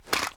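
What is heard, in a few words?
assault rifle reload settle; viper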